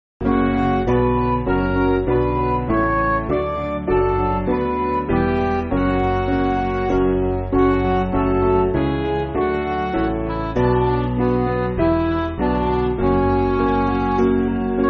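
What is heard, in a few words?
Midi, Music Backing Files for Churches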